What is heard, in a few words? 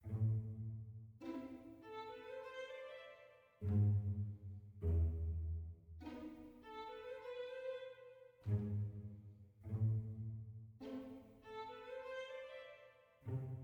mysterious soft